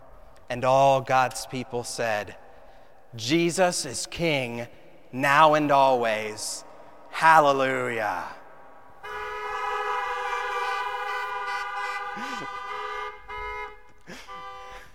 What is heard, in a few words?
benediction response